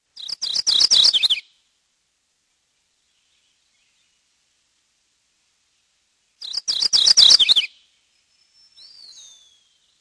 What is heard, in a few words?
mourning warbler